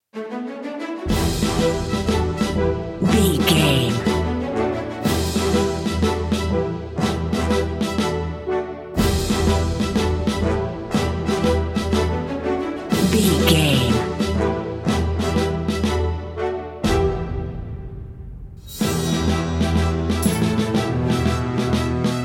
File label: sailing seven seas narrative